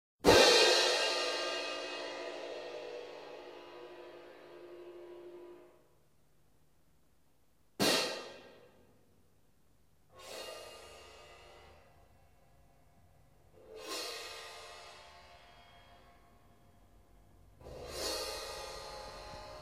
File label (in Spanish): platillos